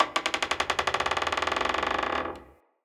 Dendrit Structure Wood Creak Small, Ambience, Fort